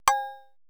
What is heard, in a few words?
Procussion; Best Service ProSamples vol.55; Retro Sampler; EMU E-III; Procussion Mix